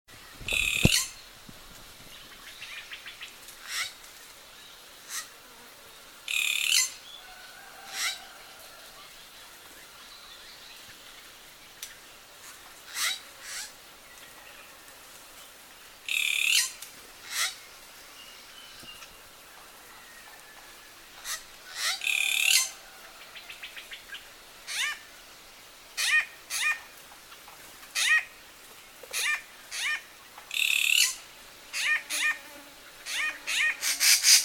Treepie Sound

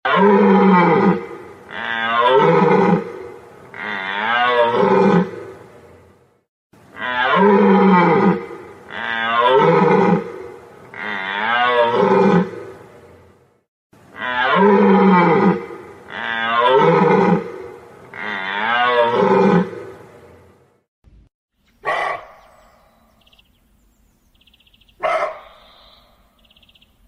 Deer Sound

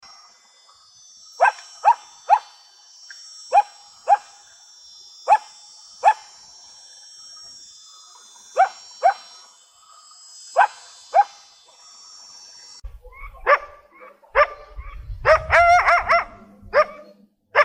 Haunting Coyote Calls

